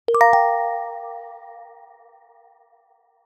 playful, notification

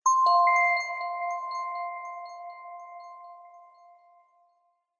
rise; wake-up-tones

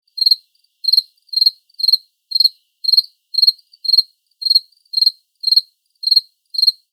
crickets in the grass stereo; animals

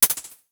drop in; notification sounds

